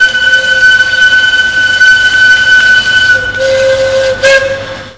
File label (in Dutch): fluit; Corpet24; mono radio